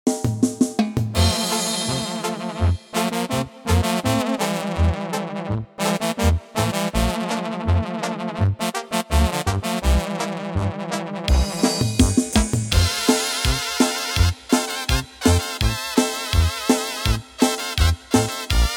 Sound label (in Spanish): Banda Swing 12 8